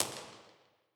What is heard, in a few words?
Real Places Foundry R, Impulse Responses; Verriere; Ableton Live 12 Lite; Hybrid